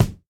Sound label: clean kick single hit f sharp key; kick drum samples